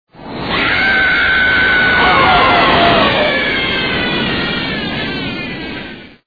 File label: screams (long); Daffy